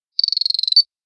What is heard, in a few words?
Beeps short series